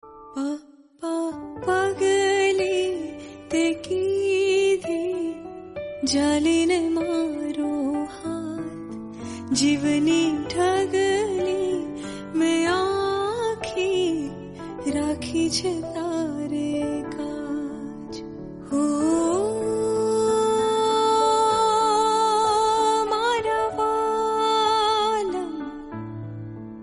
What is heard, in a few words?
song